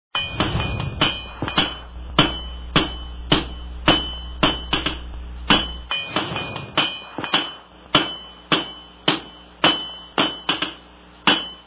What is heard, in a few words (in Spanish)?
herreria